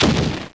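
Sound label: small explosion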